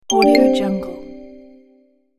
ui notification

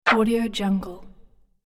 throw item infographic swoosh element; cartoon

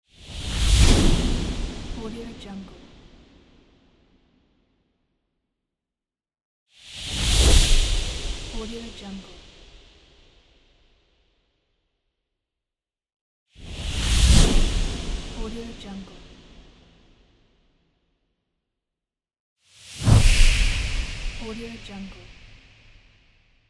air whoosh; transitions and movement

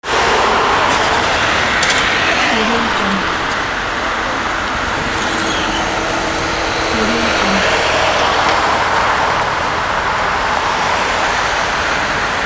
city street road cars ambience; urban sounds

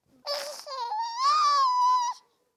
baby laughing; human sounds; خنده ی بچه

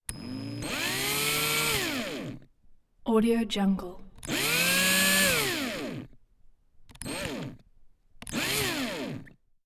construction drills drill interior revving; urban sounds

